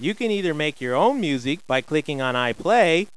music

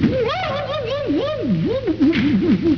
whoop

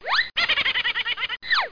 GROW AND LAUGH; DR BRAIN; BUGS

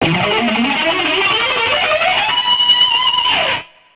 GUITAR; guitarras

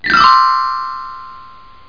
correct

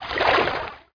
stroke; MOTS

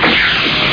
Strange AHH

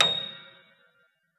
Wurly Upright Hard C7; Pro Sounds Sample Collection

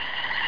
cricket

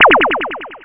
laser; Total Irrelevance 3

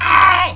pain

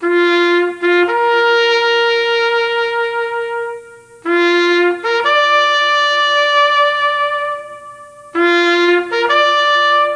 taps; workshop

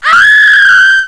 ROARK; Reflux

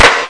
clap

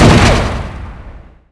shoot; PC Gamer IT CD 48; Big bang

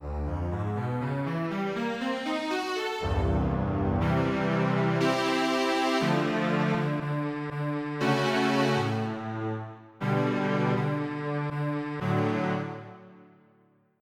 Tremolo Strings

